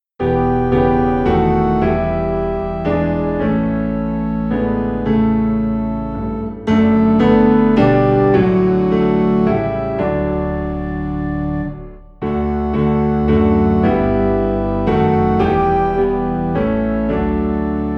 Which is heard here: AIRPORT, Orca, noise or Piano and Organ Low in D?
Piano and Organ Low in D